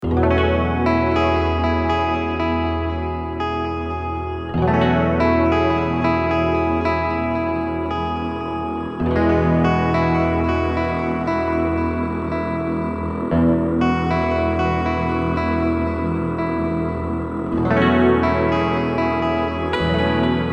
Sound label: Flight Loop